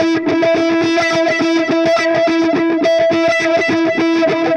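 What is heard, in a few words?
Delay Wah, Guitar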